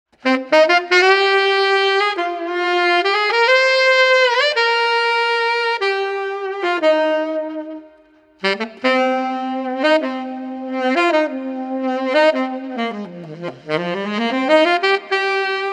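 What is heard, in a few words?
Street Tenor Sax Wandering, 90 bpm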